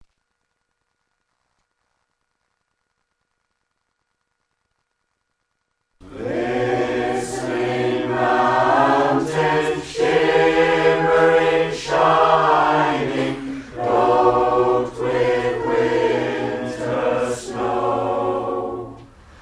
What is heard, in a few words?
one voice